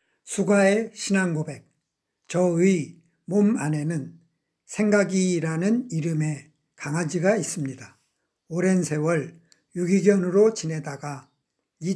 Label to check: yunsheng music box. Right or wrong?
Wrong